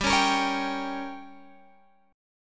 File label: G Diminished, Guitar, Open G; 17,18,20,18,20,x; down